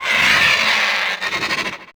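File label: drake attack; Gamestar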